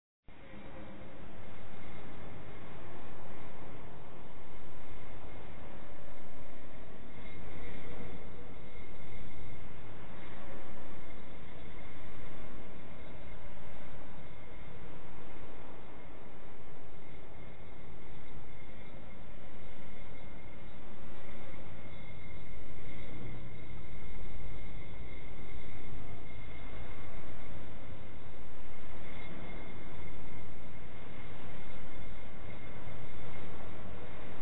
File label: 16k ambient